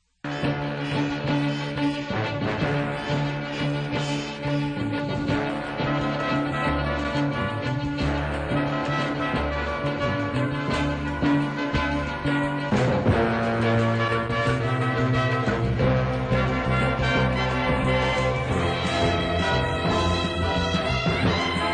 Big Orchestra

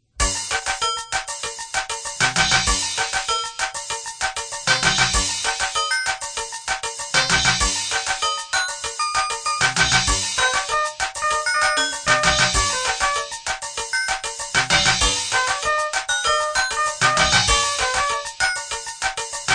RX11 tries to drive P600 & DX7

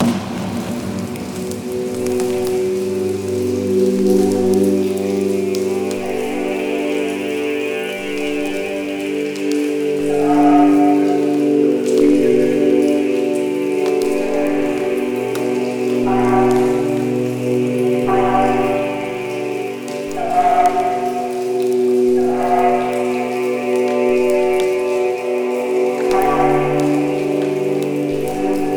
tape drone - drips; WFMU

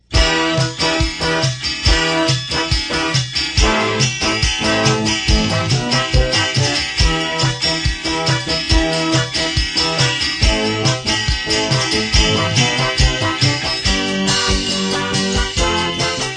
Calypso parody (backing)

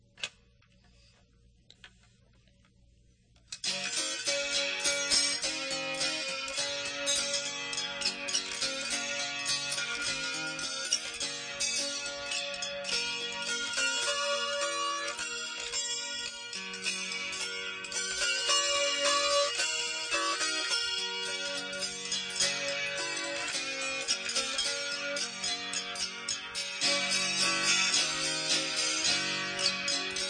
Just A Harp song

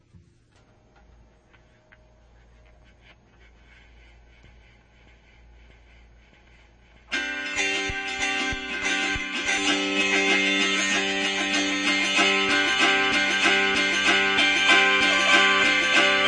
Amplified Just Harp

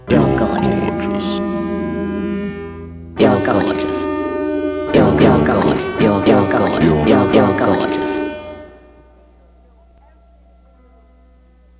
Guitar

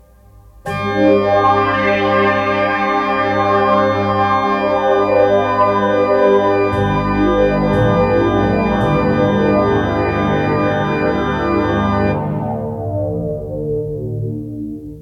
Synthesizer parts

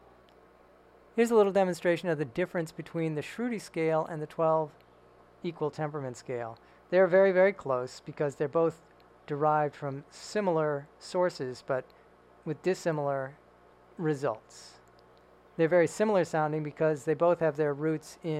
sruti, Sruti Box; iPhone

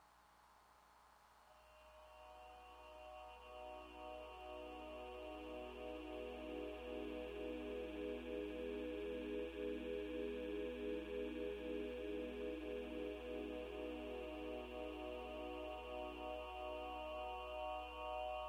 churn, Sruti Box; iPhone

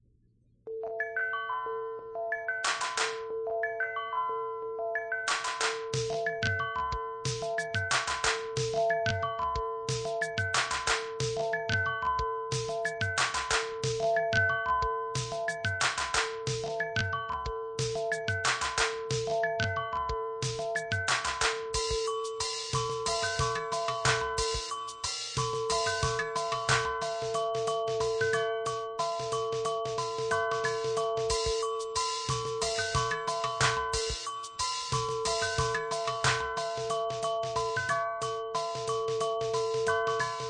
fifths

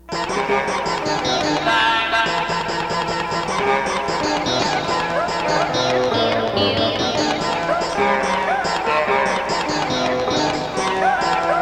Guitar and Mirage

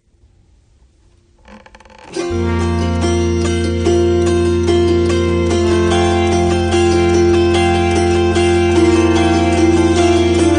P600 Horn, DX7 Fibonacci

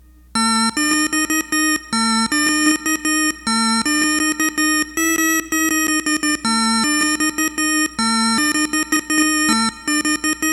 Synths

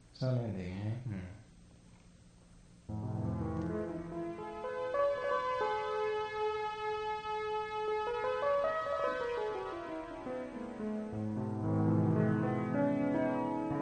DX7 P600 strings

